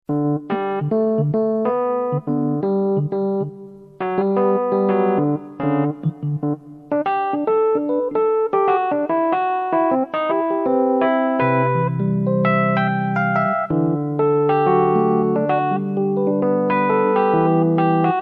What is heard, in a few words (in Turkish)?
roland gw8 sesler deneme; Batı Ses; batı sesleri üzerinde gelişi güzel; Trakya Müzik-Türk Piyanist Birliği; keşanlı mustafa